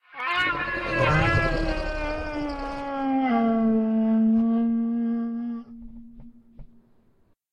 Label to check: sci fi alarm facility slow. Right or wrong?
Wrong